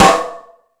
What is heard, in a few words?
Fusion Stix, Drum Machines